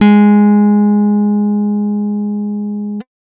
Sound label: guitar acoustic base pitch